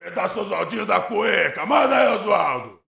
BRAZIL, World Voices; ILIO; Vocal Planet VOL-5; RIO MADMEN

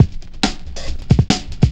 loop, Roland; Sound & Vision - Gigapack I

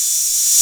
REV 606HH Op, Roland JV-2080

